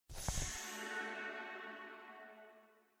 sfx ui runes runedrop; jade

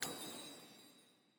summon hover; exalted hub; x10

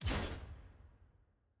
ui combat back to map

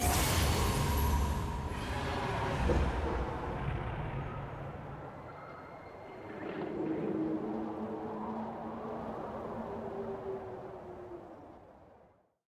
sfx ready check portal; ruby

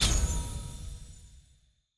shop purchase button click; exalted

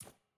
ui map panel dialogue

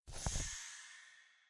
rune drop; jade

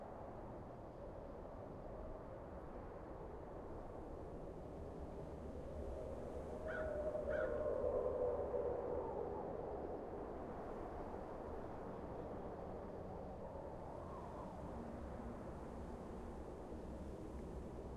sfx ambience loop; ruby

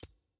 button click off

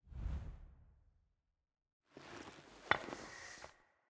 sfx cutscene

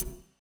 button noclick

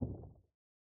ui map panel locked

